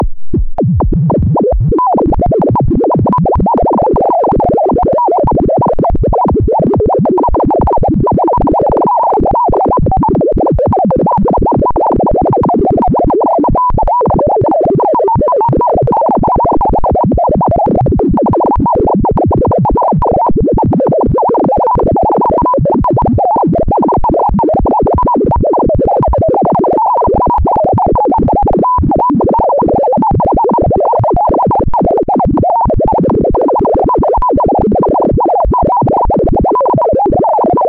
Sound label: step sequencer